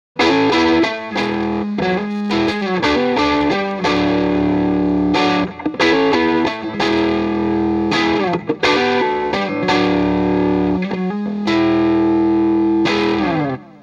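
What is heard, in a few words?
m75 lp jtm45 neck pickup dist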